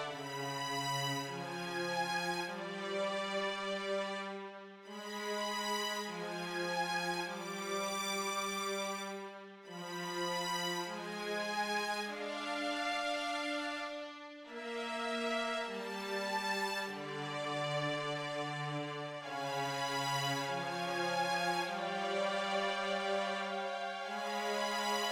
Fast Mystery (no drum)

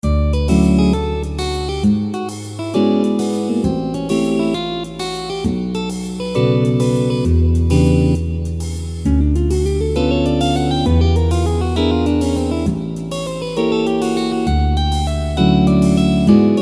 jazz

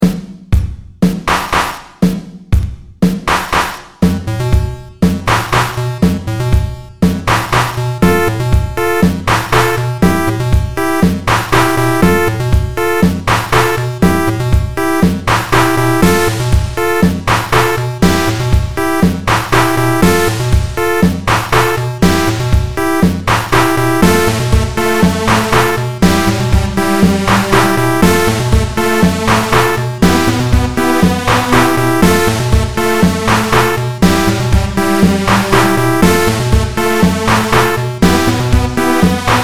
bitcrush factory, chiptune; chipville

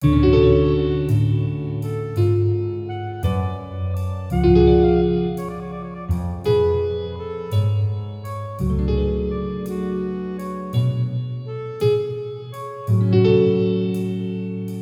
jazz

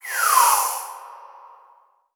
fall

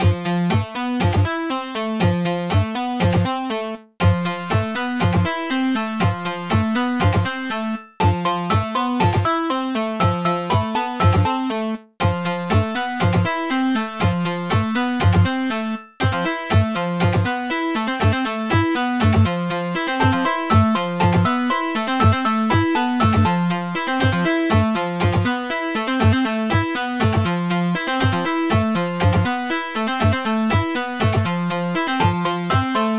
chiptune

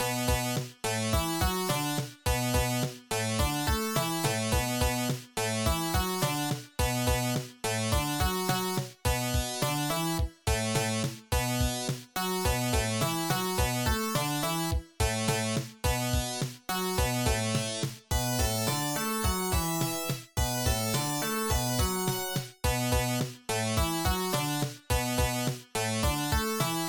super scary cave dragon sega-style fm synth remix; Fakebit / Chiptune Music Pack

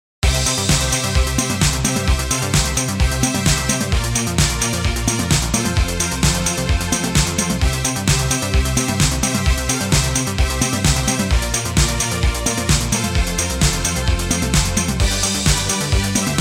aerobics video intro

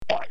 slimy monster or murderd sound

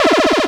laser

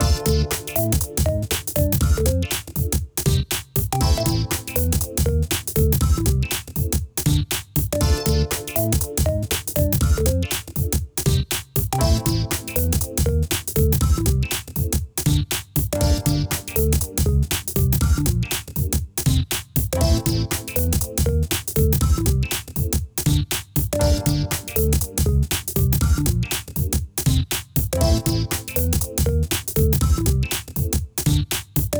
funky sidewalk antics

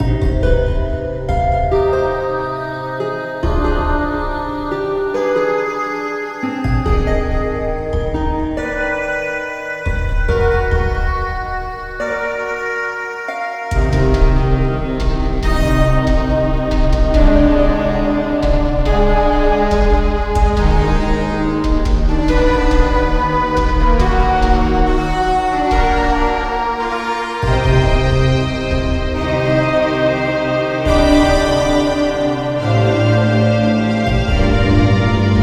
Orchestrated Piece

